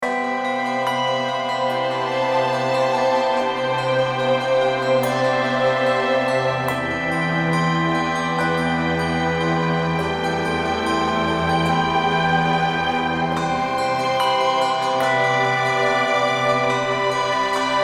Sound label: Bells Shimmering on Water